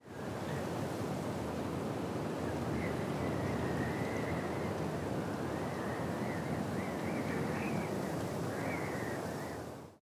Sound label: ambient; World of Tanks